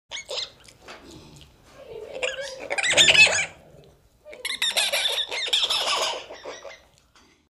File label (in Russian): zvuki malenkoj obezjanki